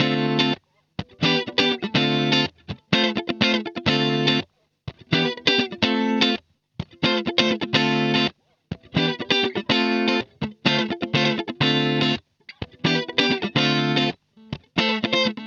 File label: DISCO GTR, 124 BPM; Drumdrops; Compact Disco